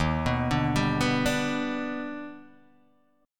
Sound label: Eb Augmented Major 7th Eb, Guitar DADGAD